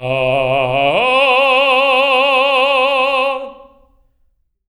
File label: TENOR C3, Voices; TENOR OCT